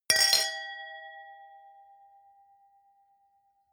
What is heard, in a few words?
wine glass different clink bounce hard; berufe; gastronomie; soundpool